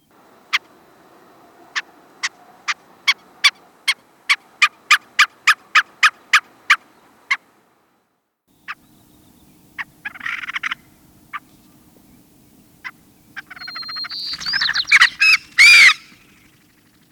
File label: White tailed Ptarmigan